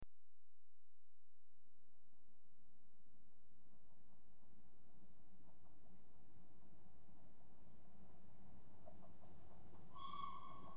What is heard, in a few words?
Train Passes High Speed Poise; BBC Sound Effects Library; Age Of Steam